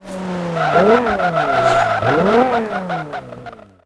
throttle off, vehicles; 370z

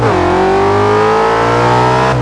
second, vehicles; gmod; tdmcars

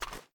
raise first start, sh; gmod; wfoly plr sh charlie725

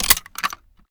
kilo98 reload empty load; gmod; viper

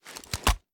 sm uzulu reload xxmag end; gmod; iw8

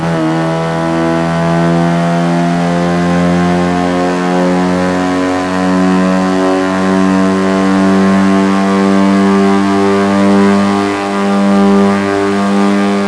fourth cruise, vehicles; cooper65

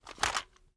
ar drop down; viper

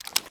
reload end start, weapons; gmod; viper

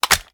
reload fast mag in; gmod

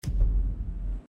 close menu, pause menu; gmod; paris

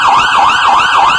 firetruck yelp; gmod; vcmod; els

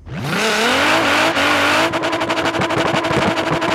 first, vehicles; nismor35

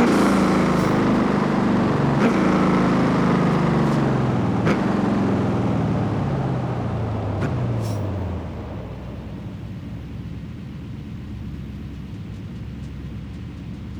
slowdown high speed, ford f350 ambu; vehicles

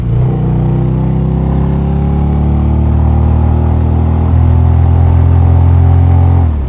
third, vehicles; gmod; tdmcars; gtav; police b

